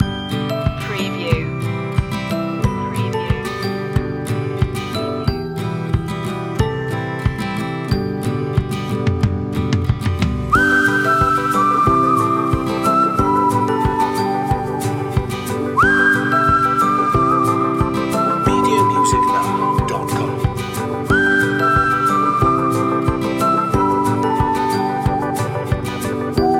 whistle along

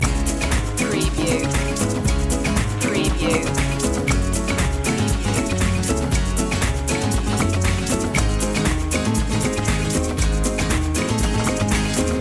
swing loop

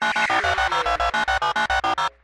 Scifi Production Element - Incoming Digits

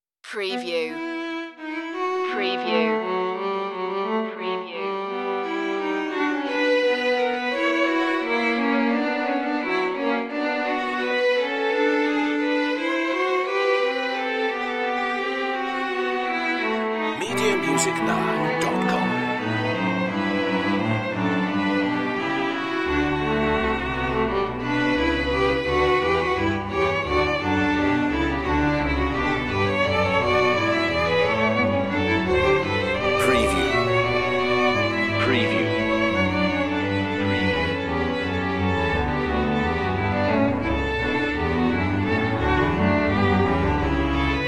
fuga e minor

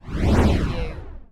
Whoosh; Sound Effects Bible

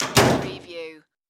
Door Close Metal

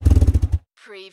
Car Motor Shut Off Engine Perspective

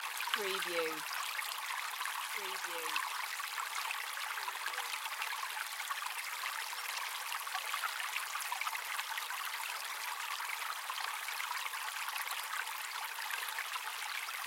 Fast running welsh mountain stream; beanstalk audio

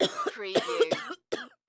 Cough Female

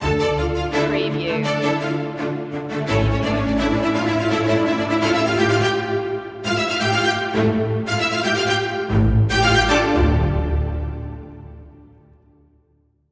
strings of passion

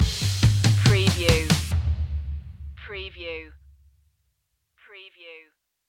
epic strings stinger

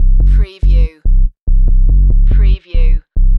Techno-Trance Sub Bass Loop in C 142 BPM

